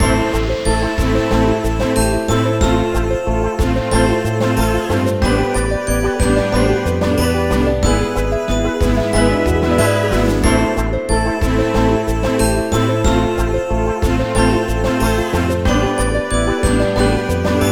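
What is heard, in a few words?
background music lobbyskin raid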